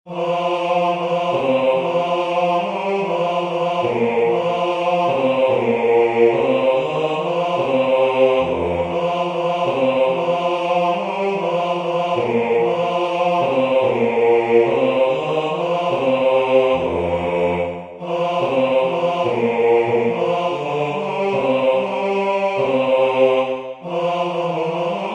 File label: Bass; ALS ICK U VINDE